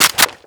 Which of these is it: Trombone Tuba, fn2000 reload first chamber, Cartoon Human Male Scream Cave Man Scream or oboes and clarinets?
fn2000 reload first chamber